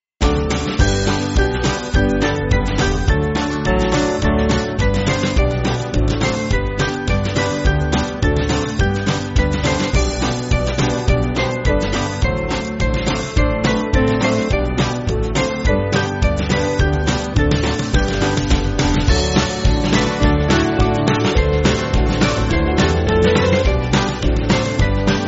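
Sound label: Band, Backing Files for Churches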